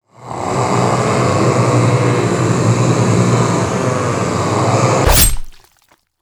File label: swarm round; zombie plague